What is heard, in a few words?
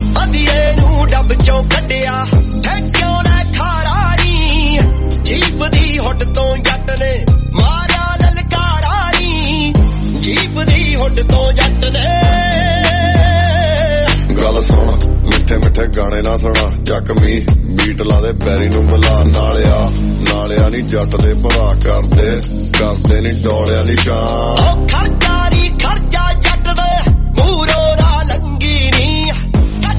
punjabi song